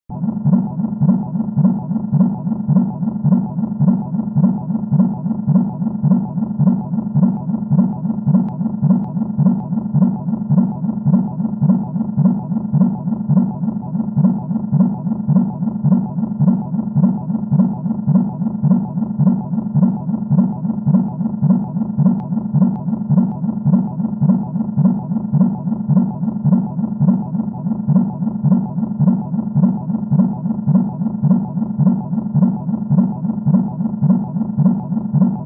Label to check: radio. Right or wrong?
Right